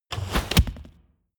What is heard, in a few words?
arrow hit; soundpool